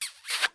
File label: kiss; soundscrate